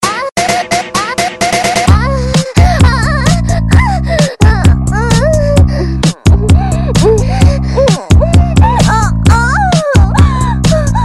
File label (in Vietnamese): tieng ren ri gai nhat; nhat ban